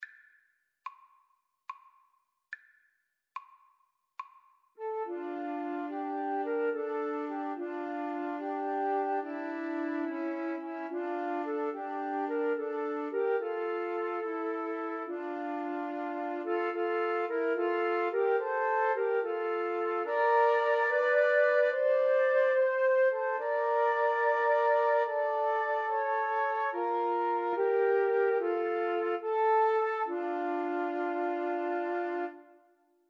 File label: gabriels message, Flute Trio